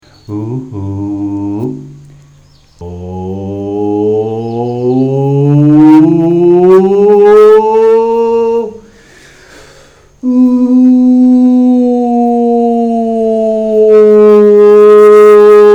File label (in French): resonance chantier